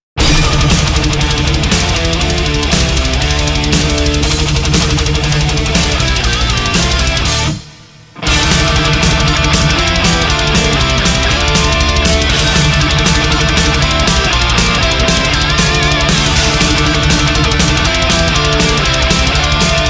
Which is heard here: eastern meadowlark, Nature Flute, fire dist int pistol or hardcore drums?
hardcore drums